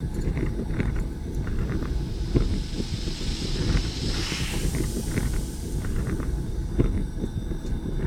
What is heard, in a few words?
oil refinery; factorio 2.0.55